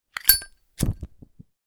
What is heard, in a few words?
Light an oil lighter